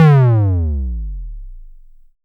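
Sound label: SYNTOM LO P, analog toms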